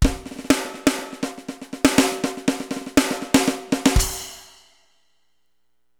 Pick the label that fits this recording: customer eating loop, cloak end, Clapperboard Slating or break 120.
break 120